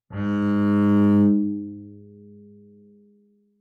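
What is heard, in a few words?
Bass arco fortissimo sul G Ab2, Double Bass; MIS Pitches - 2014; Strings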